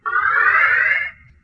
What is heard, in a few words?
alarm, computer; federation